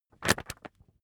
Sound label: magazine skim hard